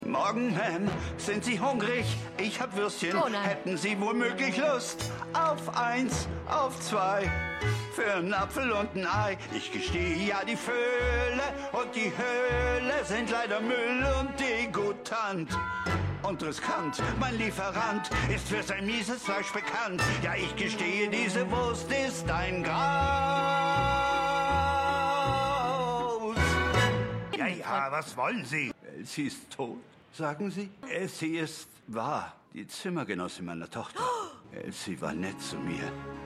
Gesang Sprache; Schmigadoon; Dooley Blight

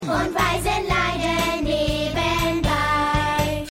Waisenkinder Gesang; Schmigadoon

